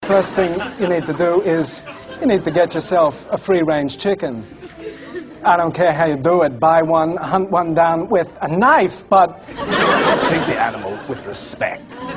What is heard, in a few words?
Acoustic